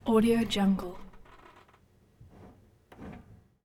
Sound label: microwave oven door open close movement; domestic sounds